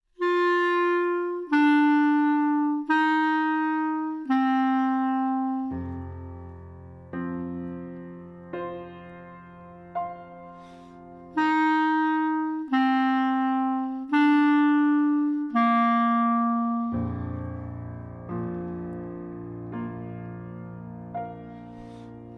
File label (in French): cloche